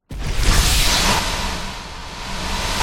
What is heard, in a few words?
Teleport Effect; horror and atmosphere fx; Silent Hill Downpour